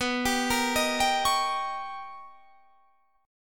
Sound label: B Augmented Major 9th B Guitar Standard, chord; B+M9; arpeggiated; 19,22,20,20,20,21; JGuitar